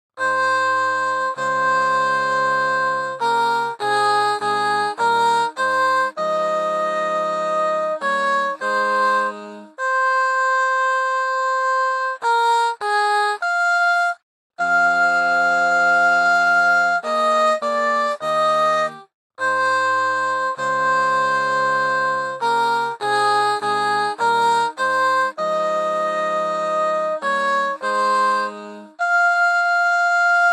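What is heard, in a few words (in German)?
Gute Nacht Sopran, übungstracks; Schumann; chornacht 2026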